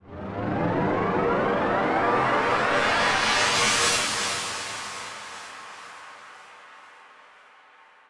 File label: warp; LcdDem SFX